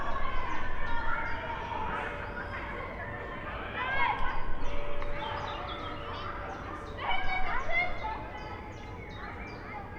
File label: screams